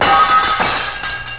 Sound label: Plate, glass; sound effects